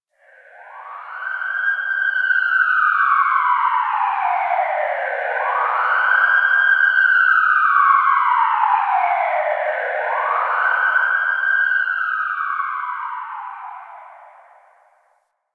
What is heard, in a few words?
Tunnel Distant Siren; Warframe; Ambience; Nineteen Ninety Nine